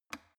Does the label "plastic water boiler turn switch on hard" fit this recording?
Yes